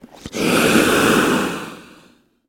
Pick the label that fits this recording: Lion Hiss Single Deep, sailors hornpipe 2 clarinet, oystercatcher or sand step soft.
Lion Hiss Single Deep